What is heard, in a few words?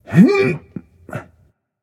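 grunt lift, male; smite; voicepack; rama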